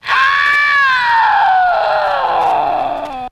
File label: scream long